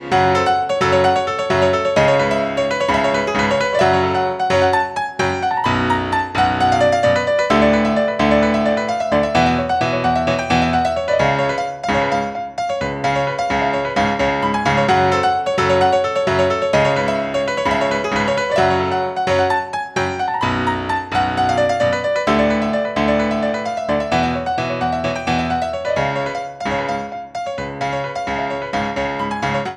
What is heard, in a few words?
end piano loop; 64beats